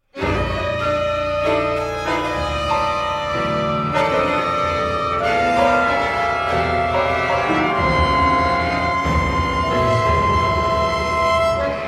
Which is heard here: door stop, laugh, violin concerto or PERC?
violin concerto